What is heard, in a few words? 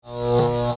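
lay power; interface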